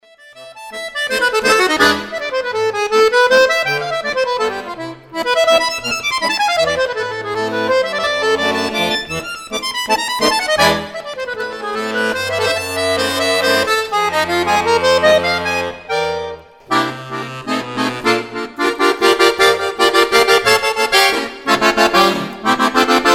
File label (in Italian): divertimento